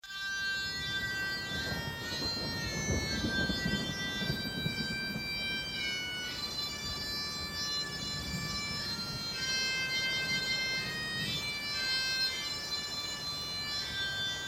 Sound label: mountain folk Tune